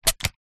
household water spray bottle empty spray single

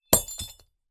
bell school handheld drop on carpeted floor

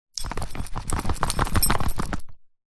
animals dog shake water off body collar rattle; zapsplat

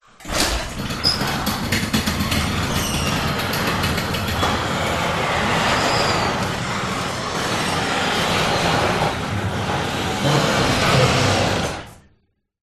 garage door electronic open or close

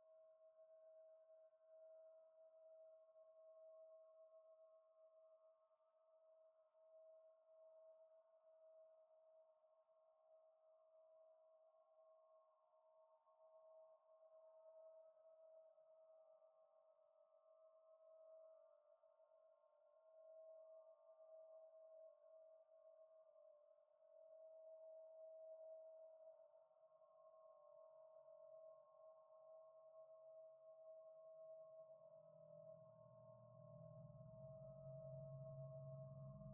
Drone Sci Fi Abstract Simple Eerie at the Start; skyes audio

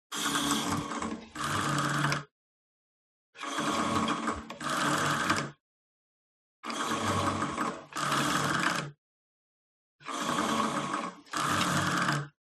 GYM Cable Machine Old Pull Release Creak; SPORT; smartsound

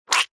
cartoon squelch squish mouth saliva

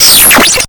sound design computer glitch

